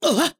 Damage

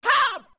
Shout; Zora Link

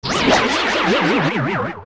Die; Like Like; zelda; OOT

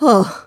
Stunned Breathe; breathing while stunned; The Lady

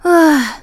Assistant Sigh; Assistant sighing; zelda

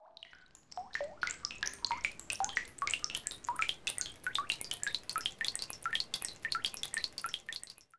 Morpha Drip; Zelda; OOT

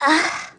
Dizzy; Zelda turned dizzy

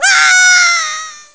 Fall; Link falling (scream, also used for Link dying); Zelda

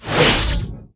Rising Attack; Rising attack (sound); Ganondorf

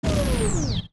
Fly Down; Goddess

